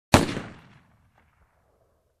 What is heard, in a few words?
Pistol shot once